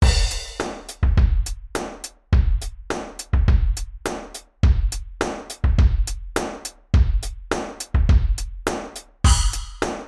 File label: modal surf, drums